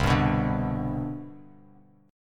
C Major 7th Suspended 2nd, C, down, Bass Standard; strummed; CM7sus2

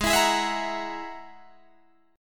Ab 7th Suspended 2nd Sharp 5th, Guitar, Drop D

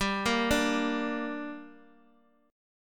G Minor G down, chord

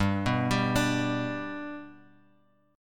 G Major G down Guitar Open G

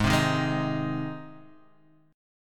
G sharp Major, Guitar Half Step Down

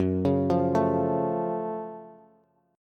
Gb Minor Major 7th Gb Bass; arpeggiated; 14,16,15,14; JGuitar

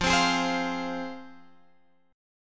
F Minor, F, Guitar, Open F; strummed; x,12,12,15,15,12

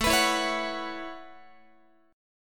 A 6th Add 9th A down Guitar; A6add9; strummed

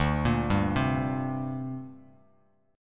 D 7th Suspended 4th Sharp 5th down Bass Standard; D7sus4#5 arpeggiated

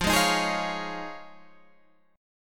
F Minor 7th Sharp 5th, Guitar, Open G

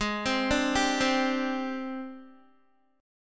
G sharp Major 7th Flat 5th, Guitar; G#M7b5; arpeggiated; 15,15,14,12,12,x; 4F,4A,5C,5G,5C,6E; JGuitar

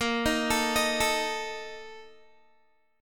A sharp Major 7th Suspended 4th, Guitar; arpeggiated

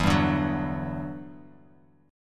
E Minor 9th, down, Guitar, Open F; Em9 strummed

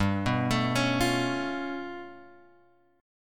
G 9th Flat 5th, Guitar, Open G

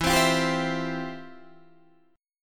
F Major 7th Suspended 2nd, E, guitar

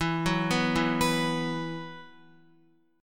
E Suspended 2nd, Esus2 chord, Guitar; arpeggiated; x,7,6,4,4,7

